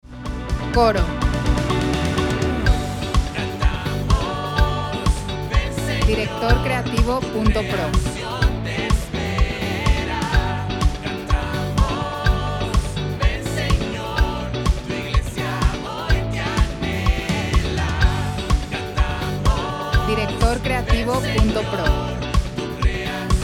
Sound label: 125bpm 4/4 D